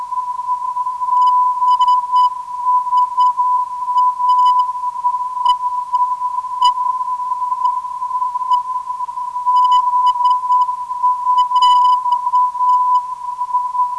520kHz, ndb

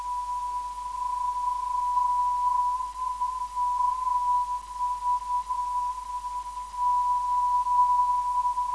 MV 434kHz

